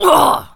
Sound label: pain, Zombies; zps; Z Waitress